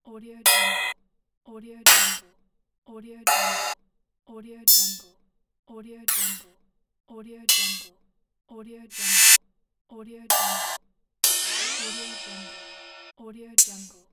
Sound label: ride cymbal hits